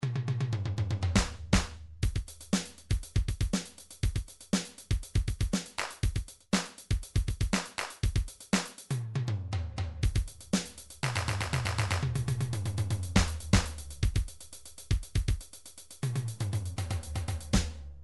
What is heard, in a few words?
midi drums electron